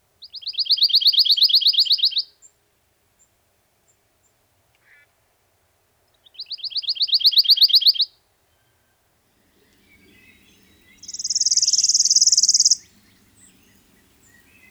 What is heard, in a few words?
pine warbler; Bird Calls; Ornithology